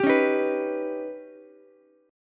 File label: Eb Augmented 7th Eb down Ukulele; Eb+7 strummed; JGuitar